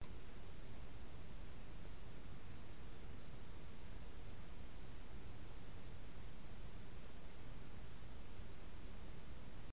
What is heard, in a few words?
Silent Room Noise